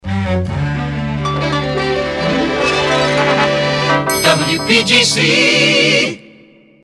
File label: jingles resings orchestra; pams series 42a